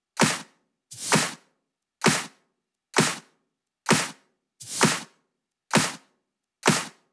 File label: 128BPM Drums; Vengeance Samples; Face to Face Kit